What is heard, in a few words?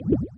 Underwater